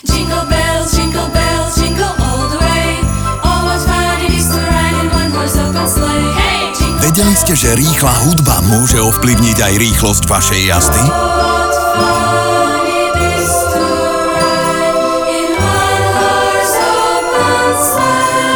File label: jingle bells; hyundai